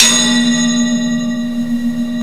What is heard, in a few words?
ENSONIQ RACK BELL